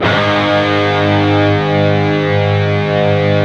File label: PRS, Guitars, PWR SLID